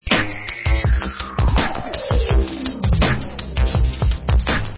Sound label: sick beat